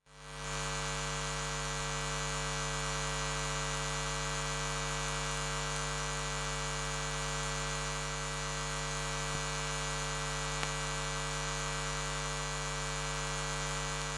noise emf radiation constant hum lcd tv screen off; sonniss gdc